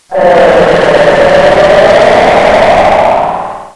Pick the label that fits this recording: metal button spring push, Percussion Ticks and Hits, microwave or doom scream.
doom scream